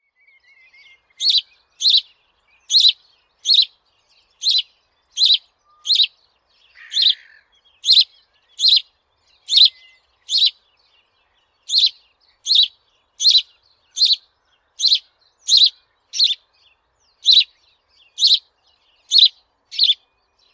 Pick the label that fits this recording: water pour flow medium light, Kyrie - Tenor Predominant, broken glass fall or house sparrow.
house sparrow